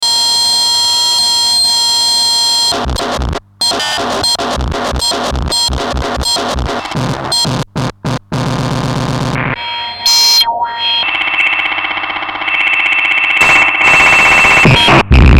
noise

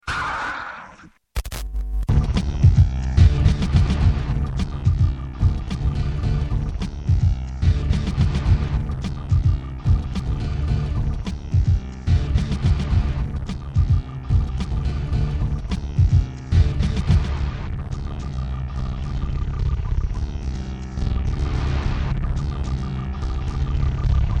raw beat sp1200

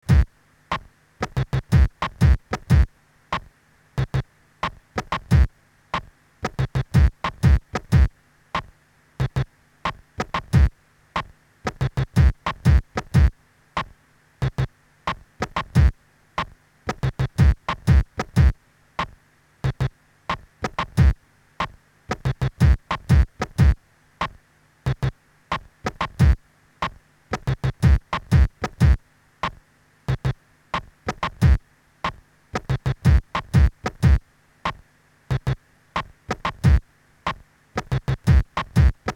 beat sketch sp1200

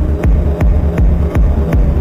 loop; pinati soundstudiolo

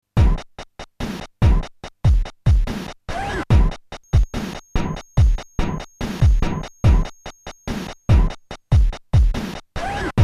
hi pitch noise skratch

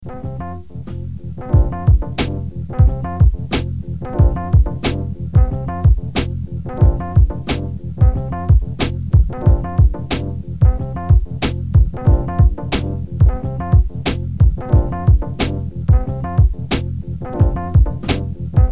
beats

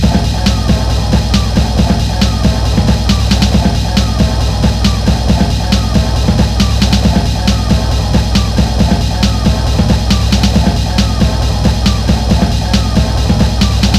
loop punched

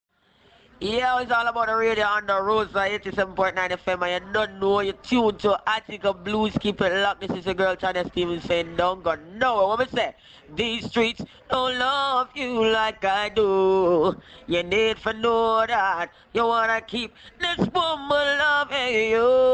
jingle

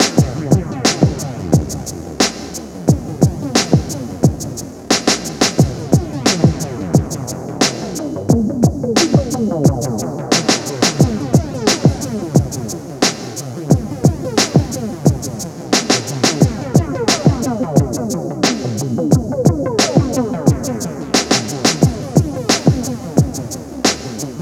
loop compresso

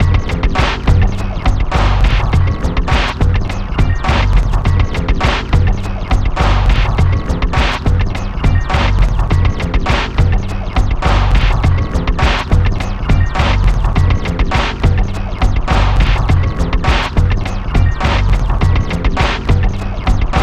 loop

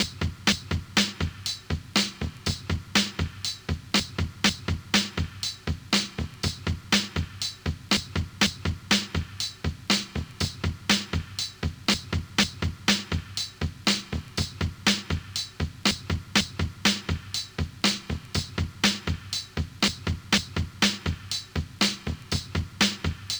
break 121bpm

